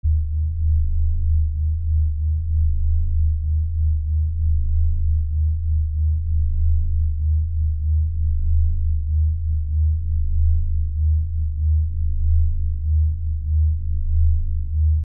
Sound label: SCI FI Hum